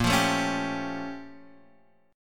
Bb Minor Major 13th, Guitar, Half Step Down; BbmM13

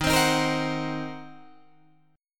D sharp Minor 6th D sharp down Guitar Drop D

